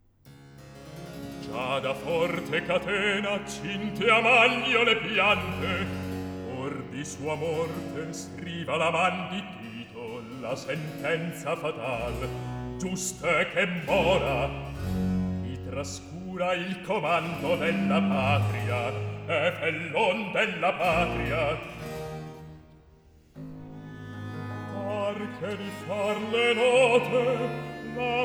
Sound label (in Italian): Recitativo; Atto 2 - Scena 12; Tito; Gia da forte catena; Antonio Vivaldi; Tito Manlio (2005)